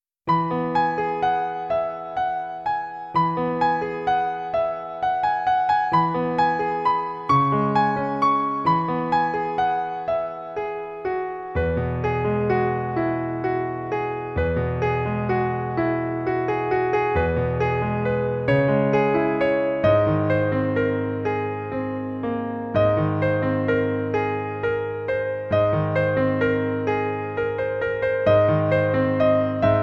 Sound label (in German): musik